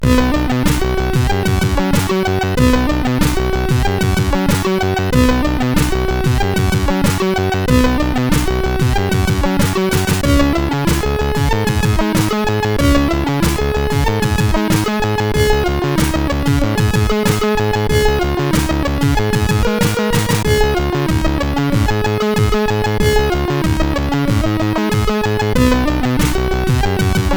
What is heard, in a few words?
News intro, CSG8580R5